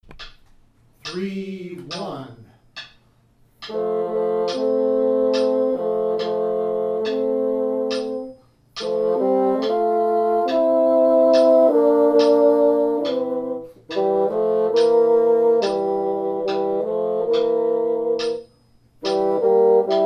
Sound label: Lullaby Bassoon 1 and 2 70; Parts 1 and 2; Rubank Elementary